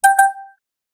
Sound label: prompt